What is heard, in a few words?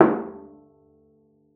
CELLO FINGER; 90 s Sample CDs; Xsample VOL-5